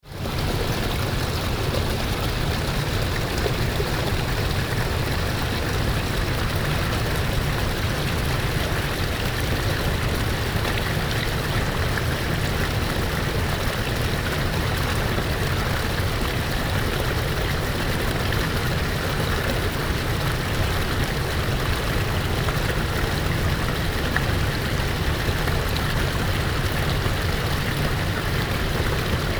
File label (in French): clapotis